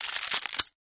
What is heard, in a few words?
Plastic Crush Shrt